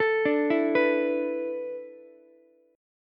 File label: A Suspended 2nd Suspended 4th, down, Ukulele; Asus2sus4; arpeggiated; JGuitar